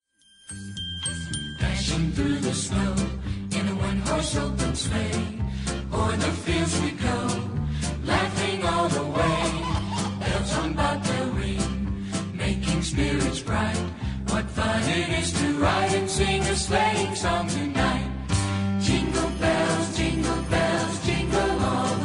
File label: Elementary Jingle Bells; english podcast